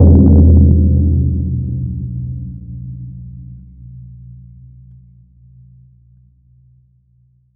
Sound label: three tightly spaced, connected; three tightly spaced stri